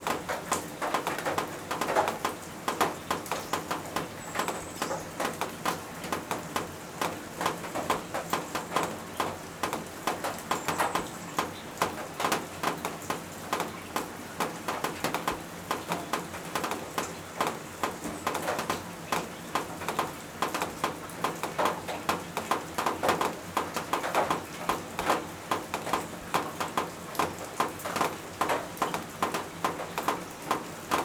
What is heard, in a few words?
roof raindrops; Nature; The Sound Pack Tree